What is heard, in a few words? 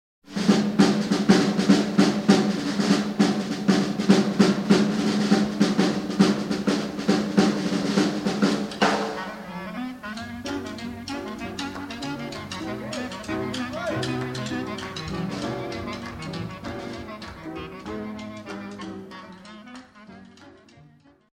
Ragtime Drummer